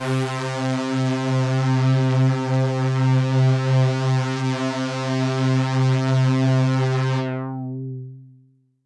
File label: Pad C, Synth Prophet One Shots; Pads; Electronisounds